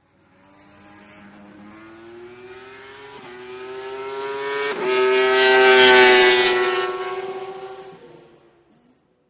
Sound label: Motorcycle Road Racing